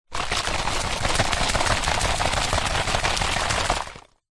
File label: bottle 1 litre plastic mostly empty shake; food drink; pepsi; zapsplat